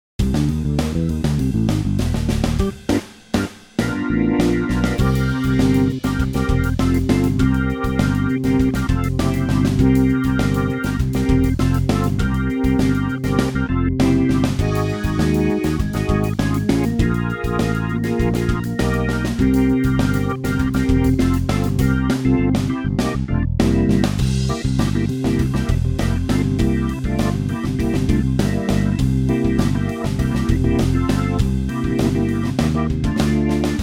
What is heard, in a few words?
jazz blues organ jam